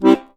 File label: HOHNER C, akkordeon; Best Service; Alpine Volksmusik